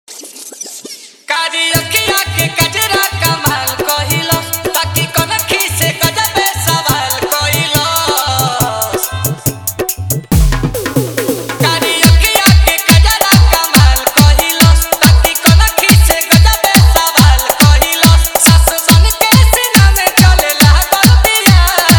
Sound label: Dj Remix Hard Bass Mix